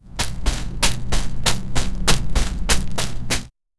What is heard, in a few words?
grass footsteps, outdoor